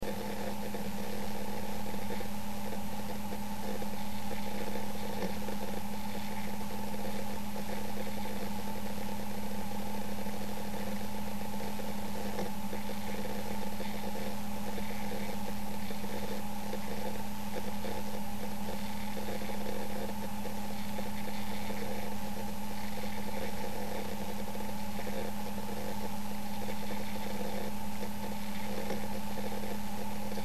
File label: seagate small, hd; hardware